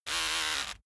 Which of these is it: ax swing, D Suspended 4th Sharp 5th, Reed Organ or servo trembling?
servo trembling